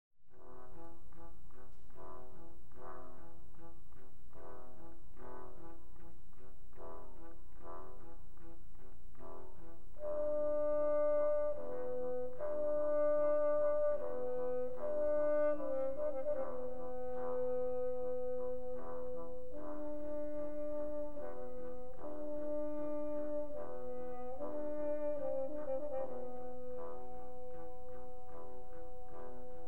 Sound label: Arabian Dance, Trombones